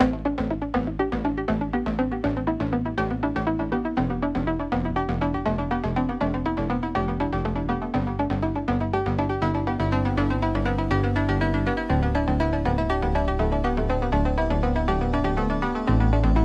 Electronic Impulse

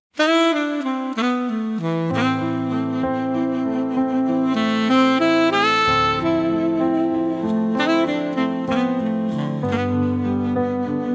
saxophone horizons

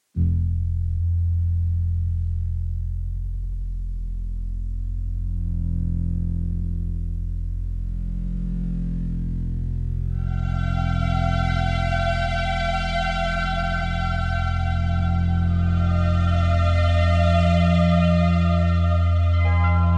deep bass meditation; la primavera; Intimate

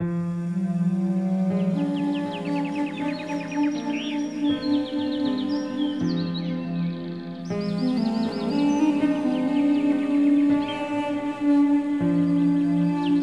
duduk with nature; 432 hz; In an oriental memory

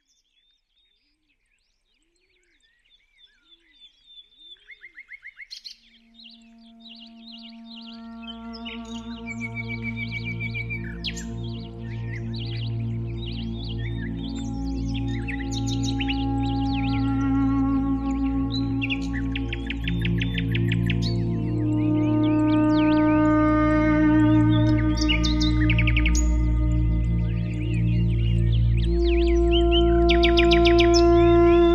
Music With Nature

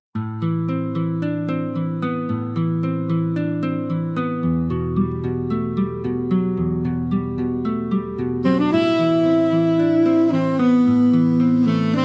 saxophone dreams

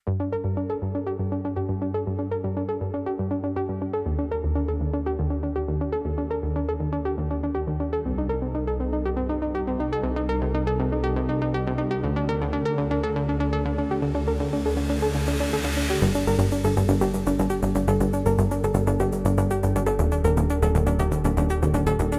Electronic Impulse

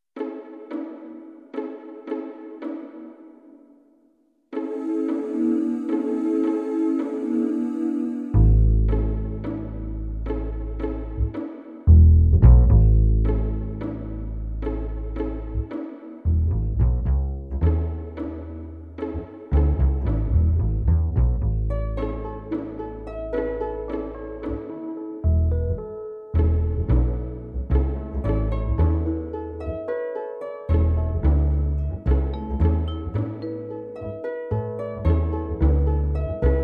vocally 432 hz

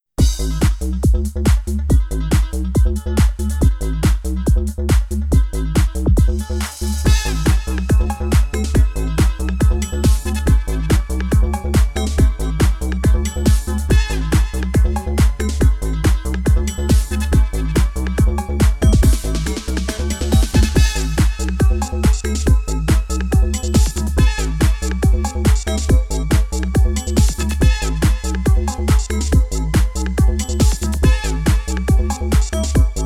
jogging 432 hz; ibro

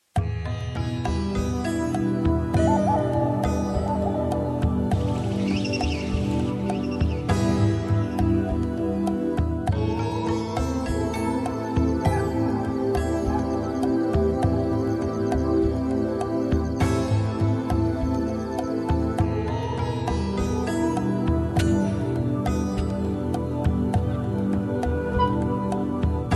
ambient with nature; shaman

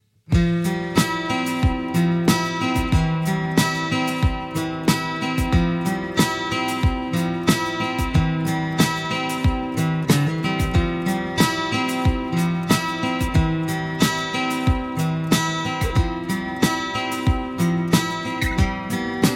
acoustic vibes, guitar song, 432 Hz

